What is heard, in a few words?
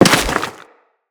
Crewman Step Dirt, Footsteps; Corpus